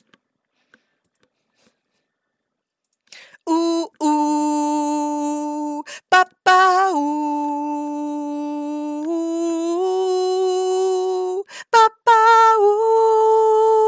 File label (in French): alto, L’intro de notre chorale, qui lance chaque concert.; Voix Alto (TT)